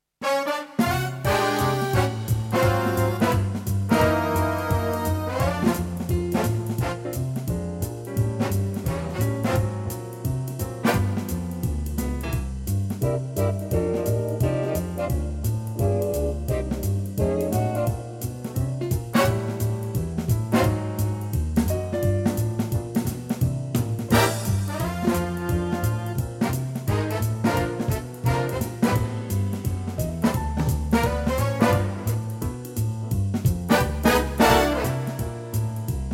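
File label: B flat live track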